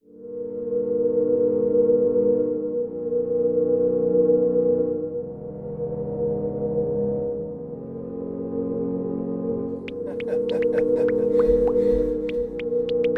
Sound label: Percussion